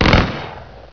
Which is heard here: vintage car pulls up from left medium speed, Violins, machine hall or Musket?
Musket